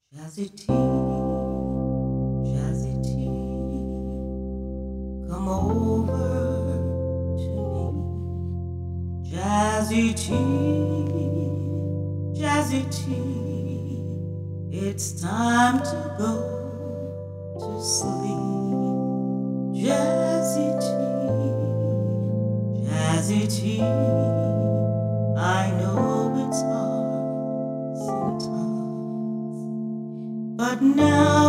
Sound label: jazzy